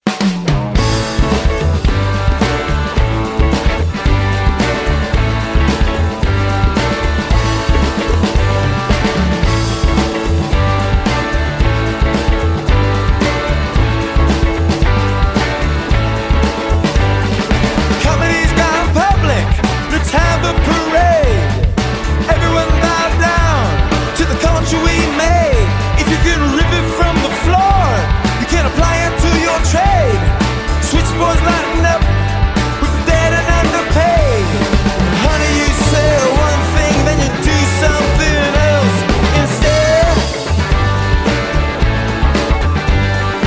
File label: Insiders Blues